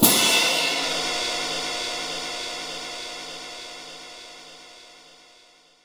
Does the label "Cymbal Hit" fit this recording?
Yes